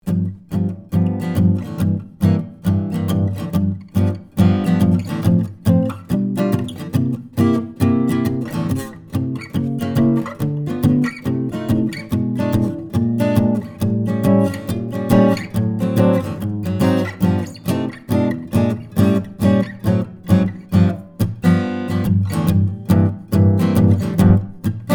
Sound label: Jazz